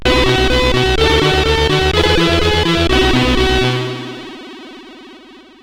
Remix, sid CSG8580R5; hvsc

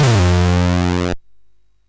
sid CSG8580R5; hvsc

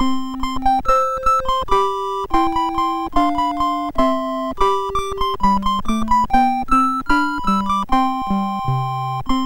sid MOS6581R3; hvsc; Boccia BASIC

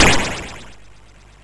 sid MOS6581R4; hvsc

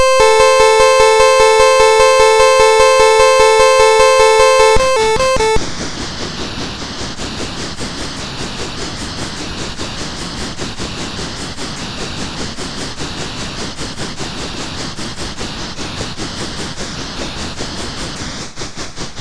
Train, MOS6581R2; hvsc

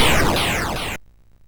sid MOS6581R2; hvsc

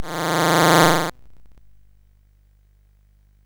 sid CSG8580R5; hvsc

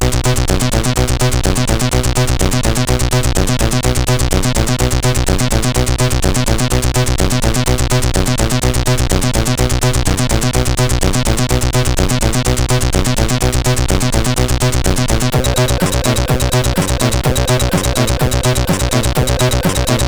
sid CSG8580R5; hvsc

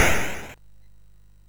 sid MOS6581R2; Gryzor; hvsc

